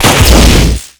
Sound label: electrical explosion no sparks combo; WAR